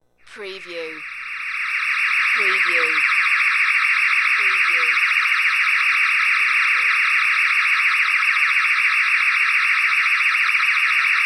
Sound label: Alien Ship